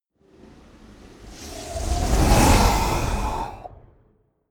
animate wind

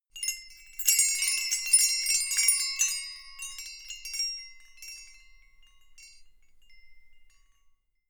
windbells ringing

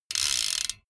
bmx bike crank freewheel chopped long

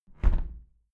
window plastic squeak close regular

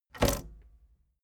tape deck bounce

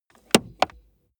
plastic plate crevice click

